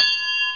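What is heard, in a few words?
hammer; SimCity 2000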